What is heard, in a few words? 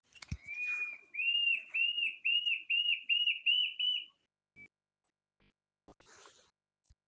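Mackenziaena, bird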